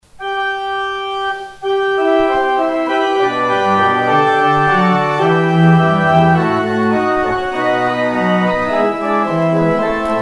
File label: organ outro; exit music